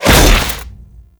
Staff Impact B, weapons

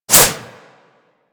Boomerang Whoosh; Warframe; Weapons

